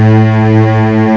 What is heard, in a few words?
CELLO; M8; CMI Factory